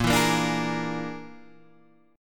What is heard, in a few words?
Bb 7th Flat 5th Bb, Guitar Standard; Bb7b5; strummed; JGuitar